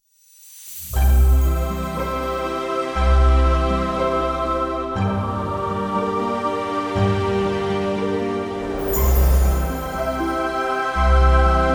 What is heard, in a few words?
fantasy magical ident short; MotionElements